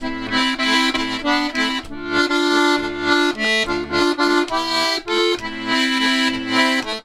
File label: Complete Accordions; USB Soundscan vol.40; AKAI